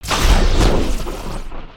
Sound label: maelstrom strike; creatures organic